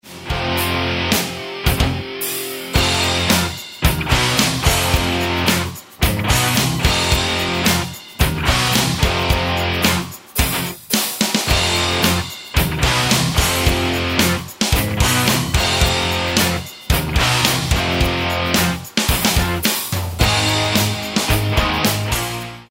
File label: metal